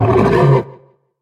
monster roar long; soundscrate

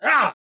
man attack light; soundscrate

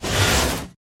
ghost scream

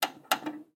VHS buttons; SoundsCrate

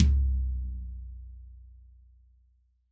tom floor; soundscrate

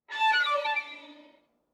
Violin Slide Down; SoundsCrate

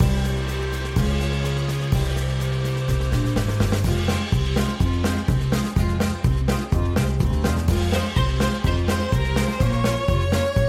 klezmer time

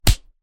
Fight Impact; SoundsCrate

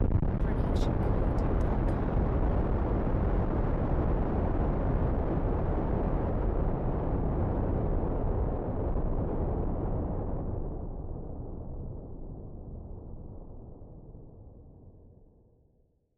Deep and Far Explosion Distorted; SoundsCrate

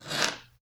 tape off; soundscrate

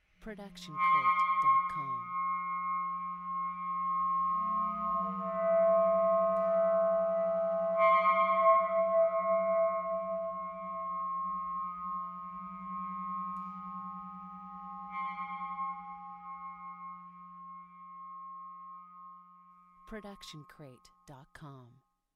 ww harmonics

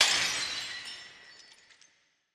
thrown glass bottle; soundscrate

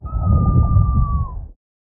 underwater scream female; soundscrate

